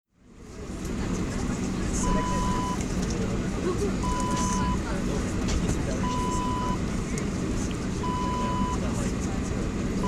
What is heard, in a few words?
aircraft interior